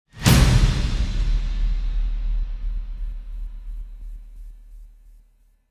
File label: Warfare Strike; Gothic Storm Music